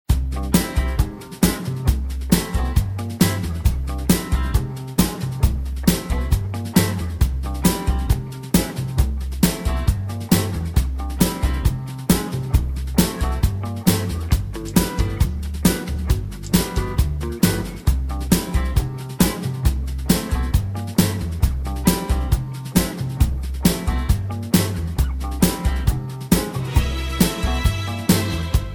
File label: C No Backing Vocals, backing tracks